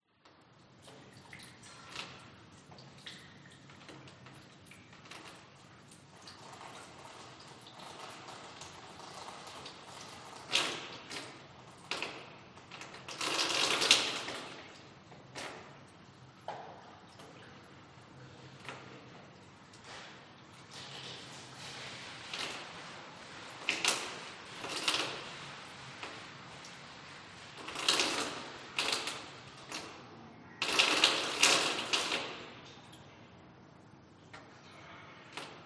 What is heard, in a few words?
OLD STUFF Garage Atmos Pipes Dripping